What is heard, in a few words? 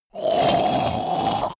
monster choking zombie dying; comedy-cartoon; soundpool